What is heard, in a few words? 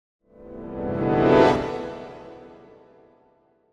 Piano Reversed Fast Ascending Tension - Light; Hollywood Edge; sound designer's toolkit v2